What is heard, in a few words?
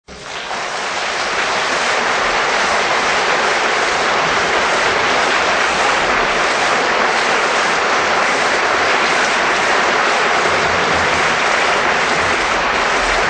Applause